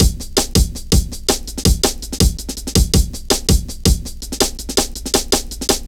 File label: Drum Loops, Jungle